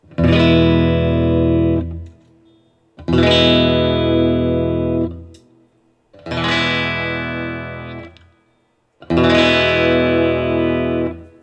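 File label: Guild M80 CS Tweed Open E, guitar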